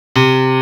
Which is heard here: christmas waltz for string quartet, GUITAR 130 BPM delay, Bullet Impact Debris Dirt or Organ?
GUITAR 130 BPM delay